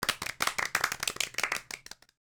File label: Cheer